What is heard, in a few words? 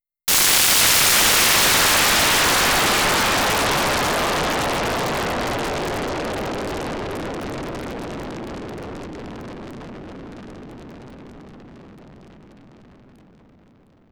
SH101 SizzleTest